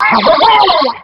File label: Blubber attack